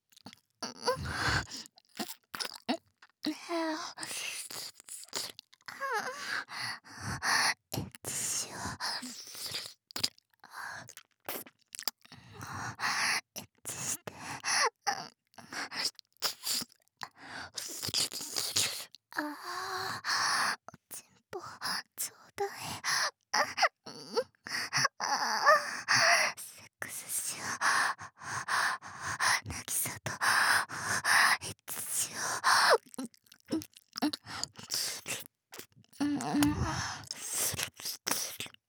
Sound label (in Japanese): エッチしよ 耳舐め喘ぎ; DL製作班; ハーレムオナサポ 4人のメイドがご主人様のオナニーをお手伝いするバイノーラル